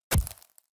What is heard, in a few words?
Flesh Impact Bullet